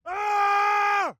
Male Warrior Scream, Battle, War, Cry, Fight, Shout; Medieval Battle; 344 Audio